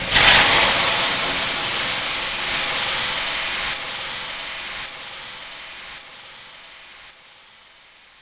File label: toxic bomb; cstrike; zp5